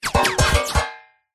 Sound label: bullets x4 hit metal quick succession; zapsplat